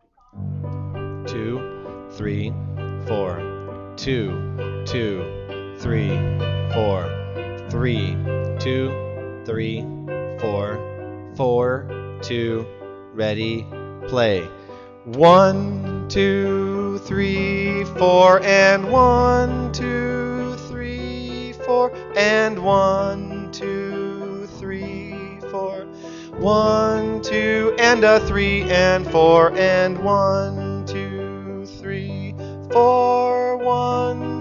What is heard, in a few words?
with count, Trumpet; Solo Accompaniments; Ave Maria